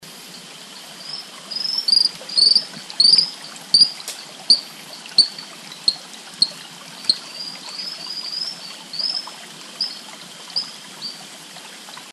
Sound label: Boophis blommersae